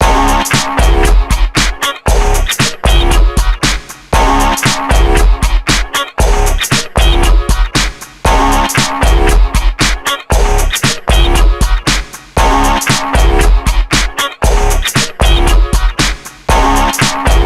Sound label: Phone Tone; GTA 5 Franklin; GTA V Franklin Ringtone